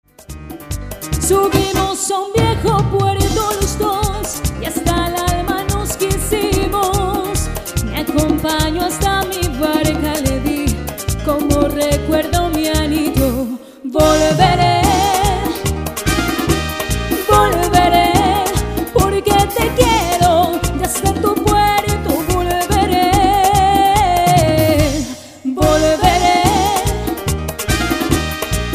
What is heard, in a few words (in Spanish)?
MERENGUE